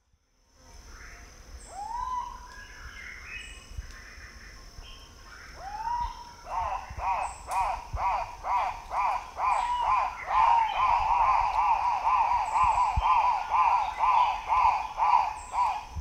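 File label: Red crested turaco, bird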